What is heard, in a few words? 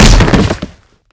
hardwood fall; Golden Crayon Sounds